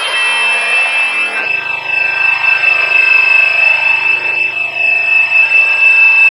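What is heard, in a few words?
Interference, Hard & Loud Techno; USB Soundscan vol.01; AKAI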